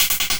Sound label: INSECTS, SYNTH INSECTS; Big Fish Audio - Alien Guitars